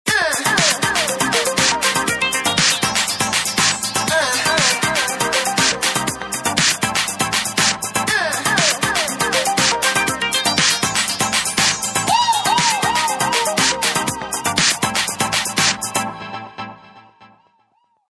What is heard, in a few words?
dive; Samsung SWD-V100